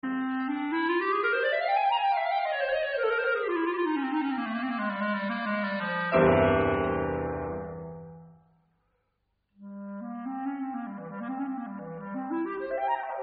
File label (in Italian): Adagio e Tarantella per clarinetto e piano; Cavallini